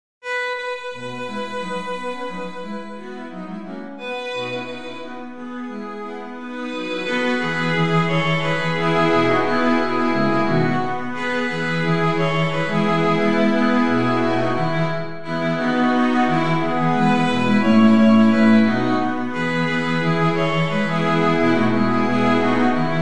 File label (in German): streichquintett